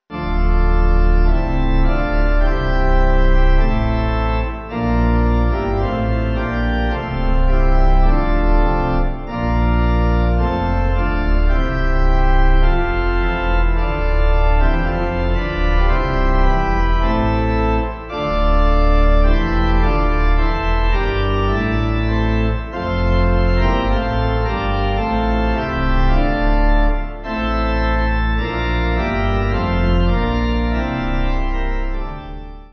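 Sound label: Pipe, Pipe Organ backing; Ah Holy Jesus; Rouen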